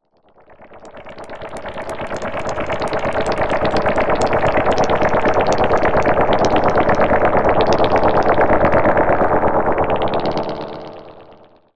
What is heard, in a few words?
sound design alien liquid